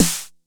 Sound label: Snare 909; gate experiments